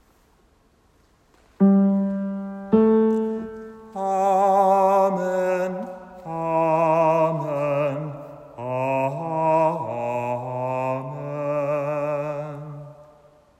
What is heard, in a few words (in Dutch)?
Amen stem 4